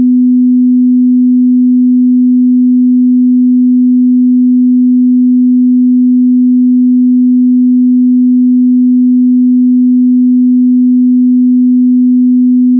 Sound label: 250Hz; Mazda